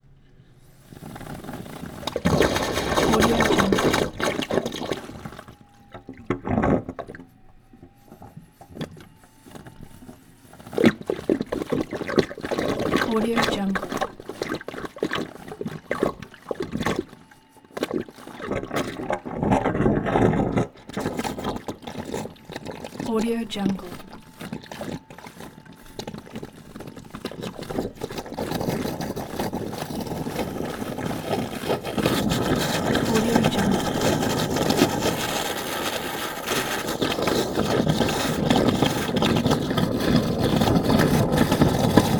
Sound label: vacuum water suction